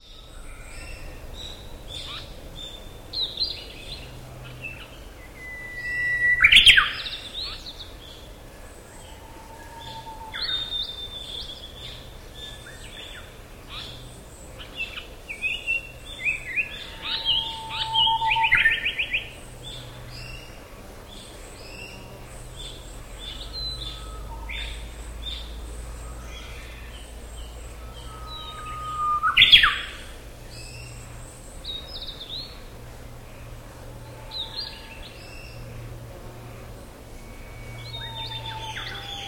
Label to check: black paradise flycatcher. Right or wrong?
Right